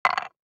DICE on Wooden Tray, Throw and Roll, Metal, 2 Two Dice; Samples; ArticulatedSounds; DICE Sfx Party (Extended)